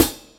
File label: BRUSH HAT; Hip Hop; E-MU Formula 4000 Series Vol. 1; Hip Hop Nation; Phatt Planet